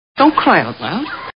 cry loud

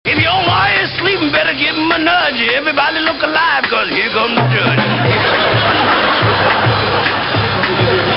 laugh

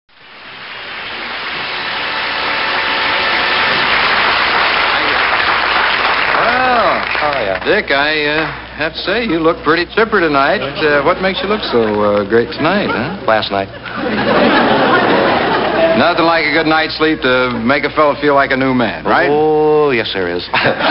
laugh

